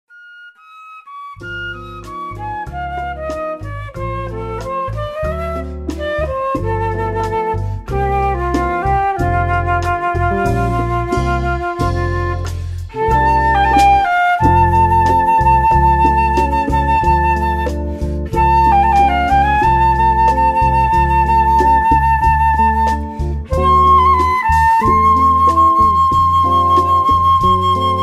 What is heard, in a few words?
south america sensual